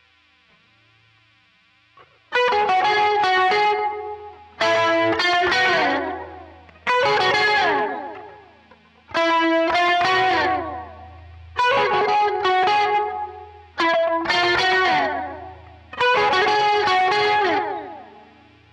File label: solo; quilter